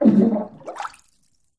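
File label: Bathtub Fart Short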